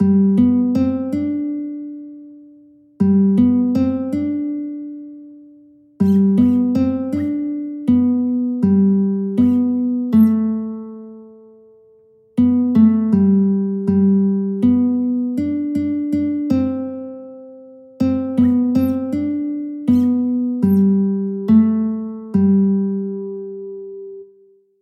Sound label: guitar; when the saints go marching in